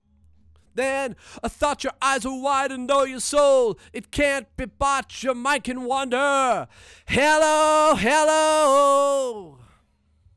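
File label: vocal; Pro Tools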